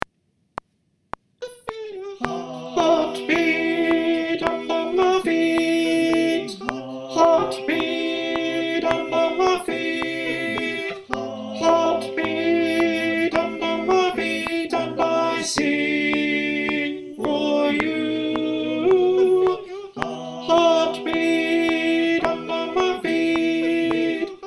alto click

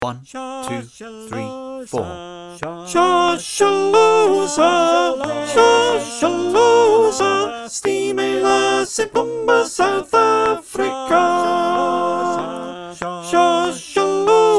Shosholoza - Sop - click

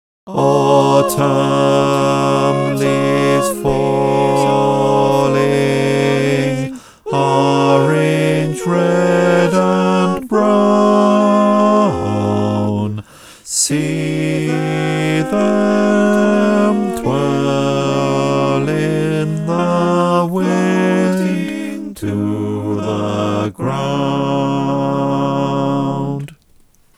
bas, choir

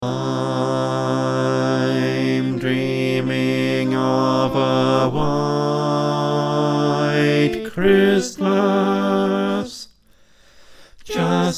bass, choir

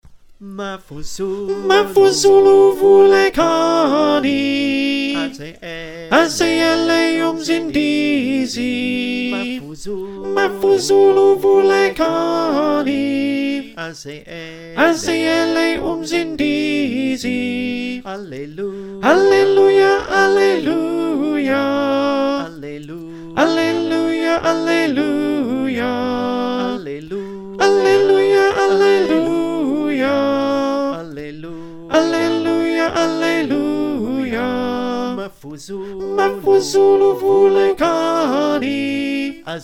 Alto, choir

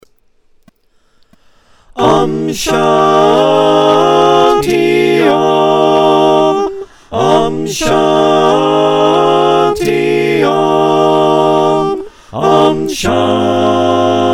SATB, choir

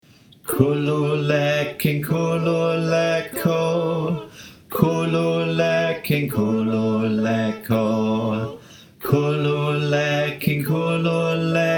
Complete - Bas

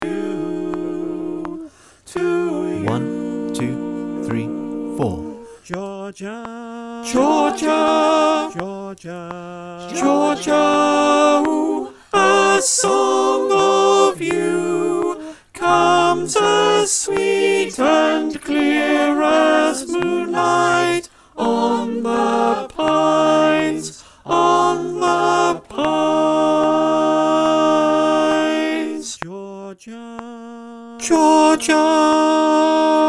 Alto click

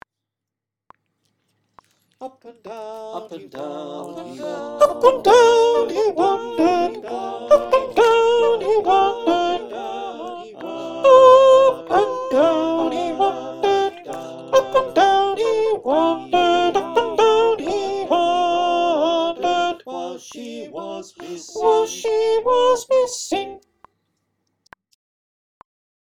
sop, choir

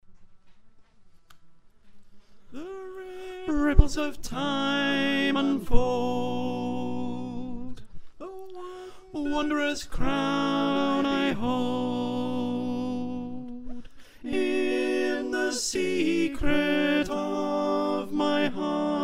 Alto, choir

